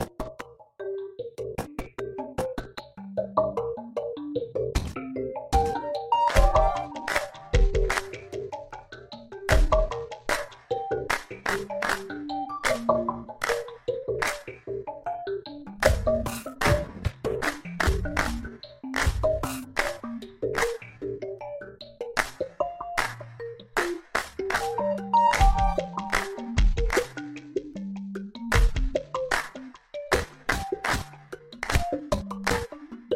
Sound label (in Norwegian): musikk sakte